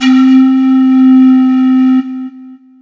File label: TG-33 Pipe C5